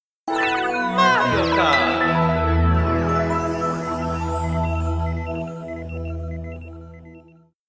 wii mario kart channel menu

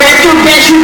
ear violation

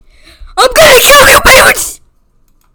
funny hahahahahaha

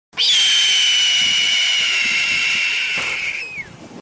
red tailed hawk screaming

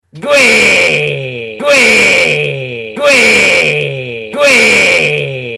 gweee

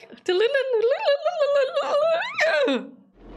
didlidledlidledlidledlidledlidle

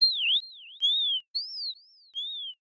crowd cheer

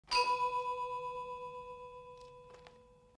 ding; akira

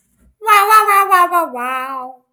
bamm

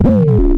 window close

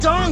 dong

